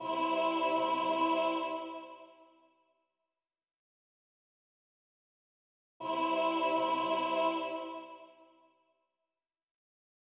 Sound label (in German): Gloria mit Soli Alt